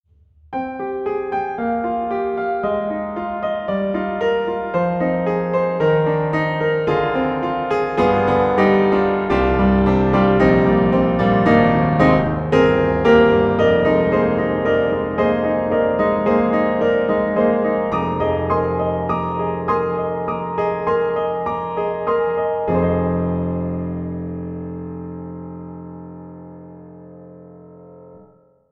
Pianoteq D4 Classical Recording AB, piano; MODARTT S.A.S.

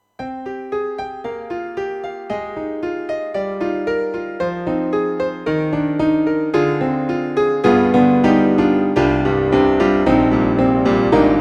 Yamaha MU500 Old Piano

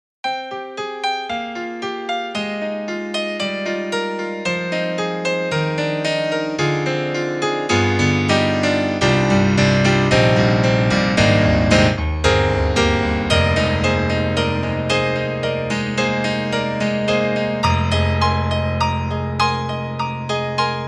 Bright Mono Piano; KORG KRONOS 2

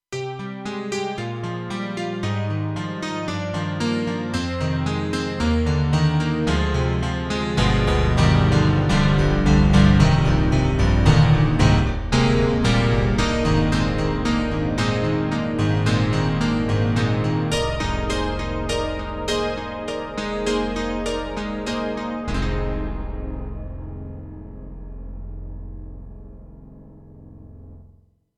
Korg TR-Rack 90's Piano

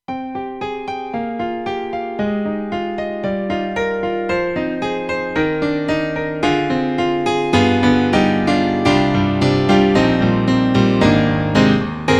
KORG X5DR Piano

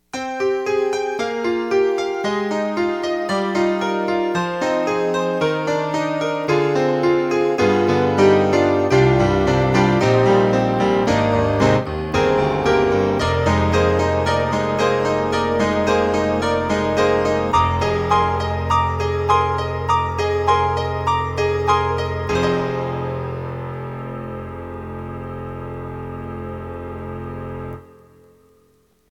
UltraProteus Stereo Grand

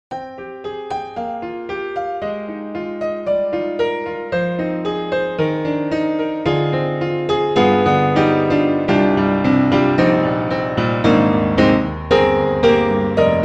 Modern Upright Live Modern Solo; Upright Pianos; Synthogy; Ivory II

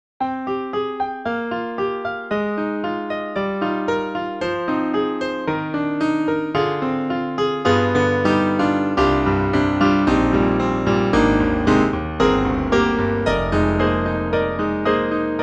classic grand piano damped; Hispasonic; Hispasonic Sampled Series

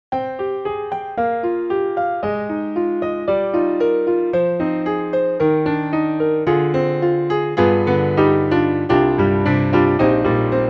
Classic GrandPiano standard; Hispasonic; Hispasonic Sampled Series

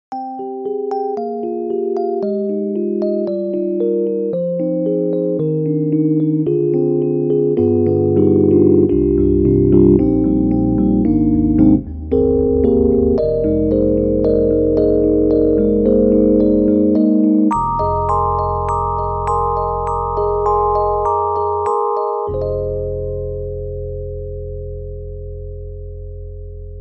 Soft Stage EP; IK Multimedia; SampleTank3

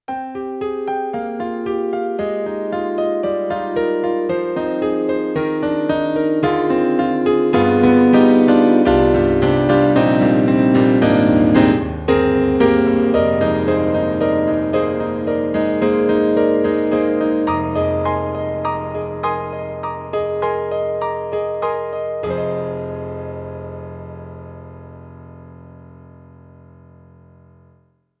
Yamaha MU90 Ground Piano KSP

